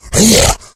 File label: rage, infected, male